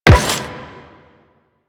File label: Run Metal, Footsteps; Warframe; Quests; The New War; Kahl